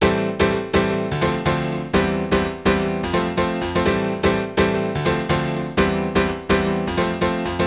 PIANO HOUSE 125 A, Keys; eJay

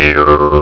Jaw Harp; Darts

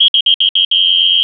WHIST; SOMC Family Forum

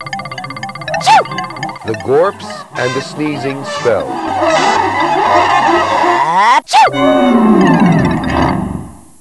hup choo

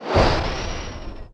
Rocket Pod Launch; WarZone 2100

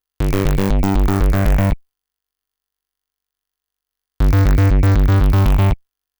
BASS; Evolution

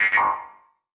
DRUM SHOT HOUSE 125; eJay; Singleshots

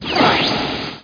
hi jump; VGMAX

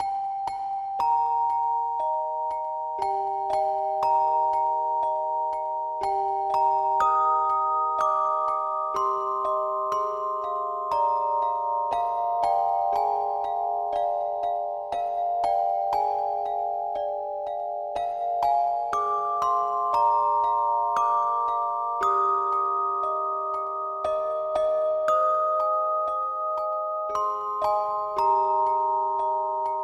kids; Microsoft Graphics Studio; Picture It! 99

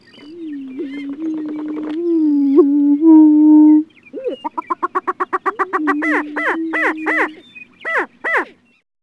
prchick

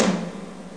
TOM, DRUMMACH; Leonardo The Inventor; Broderbund; Riverdeep

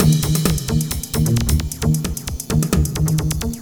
jingle

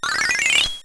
Fade; Treasure Galaxy (1994); Learning Company

